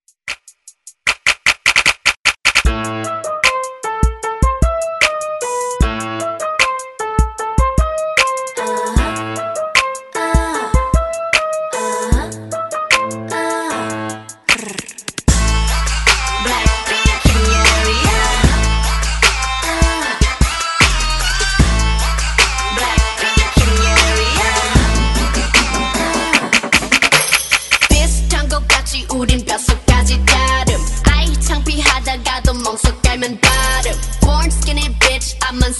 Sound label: Kpop Redrum